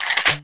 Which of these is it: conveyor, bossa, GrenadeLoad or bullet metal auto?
GrenadeLoad